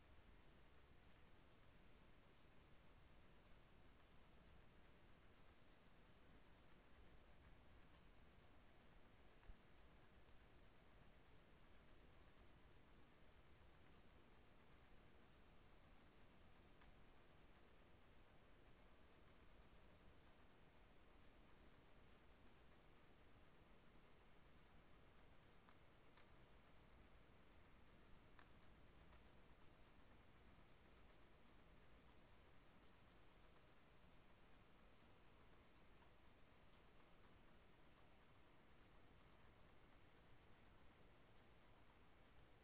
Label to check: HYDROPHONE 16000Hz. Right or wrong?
Right